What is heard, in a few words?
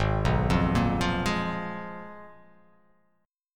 Ab Minor 6th Add 9th, Ab; arpeggiated; JGuitar